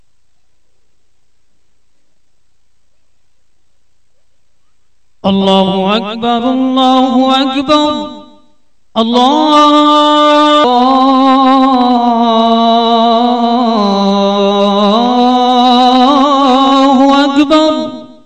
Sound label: ADHAN